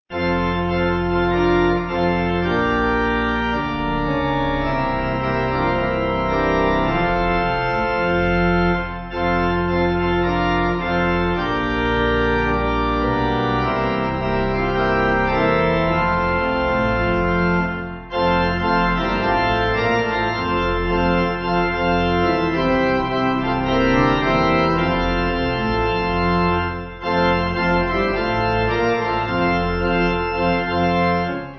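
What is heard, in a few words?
Pipe organ; Speak Just A Word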